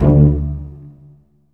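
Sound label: cello pizzicato D1, Virtuoso Strings; ILIO; Kirk Hunter Virtuoso Strings VOL-3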